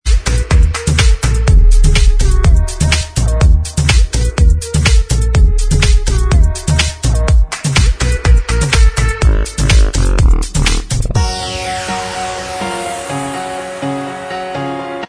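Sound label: Instrumental Track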